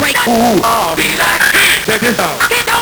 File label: Nintendo Gameboy LSDJ Acid